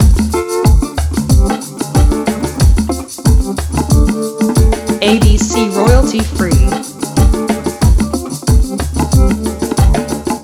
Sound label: Slide Groove loop